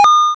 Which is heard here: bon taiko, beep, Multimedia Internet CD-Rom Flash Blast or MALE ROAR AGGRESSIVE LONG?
beep